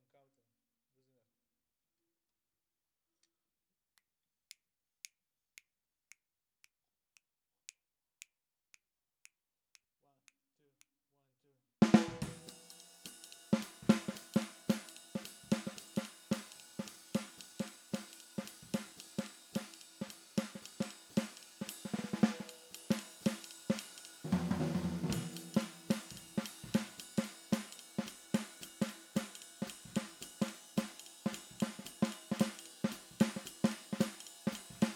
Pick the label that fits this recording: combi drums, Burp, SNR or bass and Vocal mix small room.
SNR